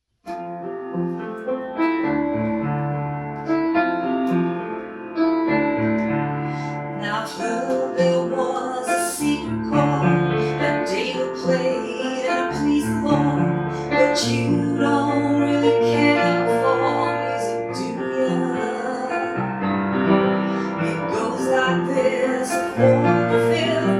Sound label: Vocal Piano